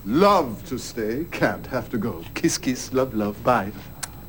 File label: kiss kiss